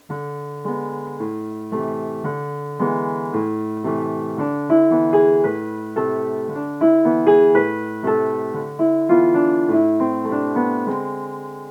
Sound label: polka